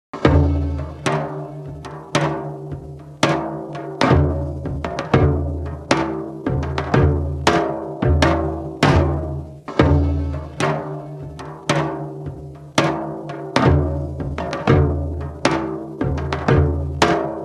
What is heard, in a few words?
tapan solo